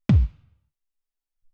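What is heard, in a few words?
Tek Kick, Alesis SR-18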